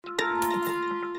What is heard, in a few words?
interaction; The Cat Lady